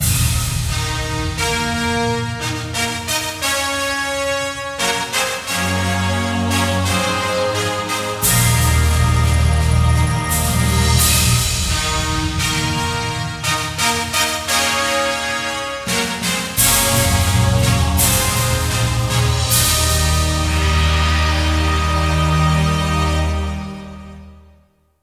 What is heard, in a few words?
Fanfare; K1 World Grand Prix 2003; PS2